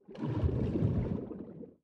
spin start; reef raider

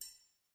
slider Increase Down; lost relics

